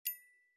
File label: bell